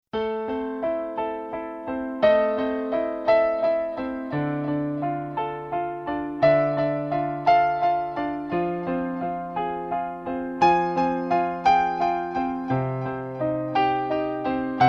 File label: piano